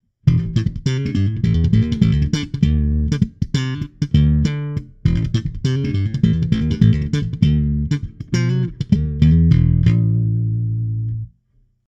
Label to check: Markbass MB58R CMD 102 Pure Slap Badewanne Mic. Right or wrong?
Right